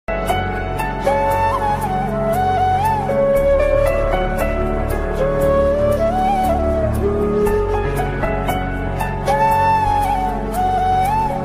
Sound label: new sad hindi flute music ringtone